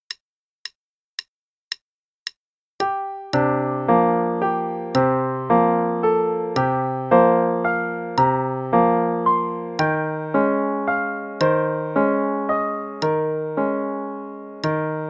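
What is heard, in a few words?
Play-a-long, Waltz, quarter note=112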